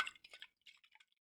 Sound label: Impact Flask